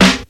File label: short snare drum sound b key, steel snare drum sample tuned to the B; snare drum samples